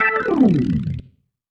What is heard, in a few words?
Slide, Organ, Loops; Sonic Foundry (Sony Creative Software); Joe Vitale Organ Donor; Slides